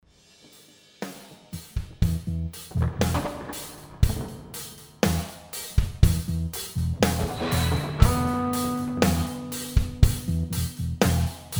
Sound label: Jam, Guitar, Keys; Jamjar